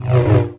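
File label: sith lightsaber Swing; weapons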